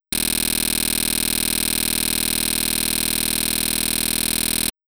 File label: Pulse train from t consonant